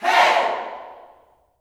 "Hey", Vocal Gospel yell shout; Spectrasonics; Vocal Planet Vol.1 Gospel; akai

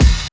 BD

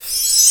FX BELL; E-Lab; X Static Goldmine 2; akai

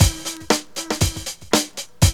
LOOP; E-Lab; X Static Goldmine 2

